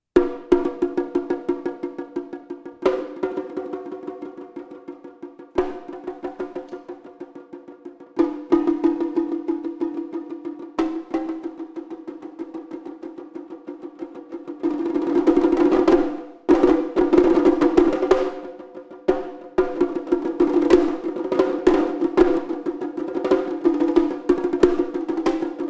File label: shime; percussion